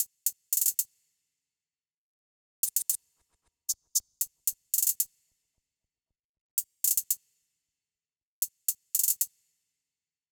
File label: Drum Loop 114 BPM Hihat, RnB; City Lights; Cymatics; ANALOG EVOLUTION Beta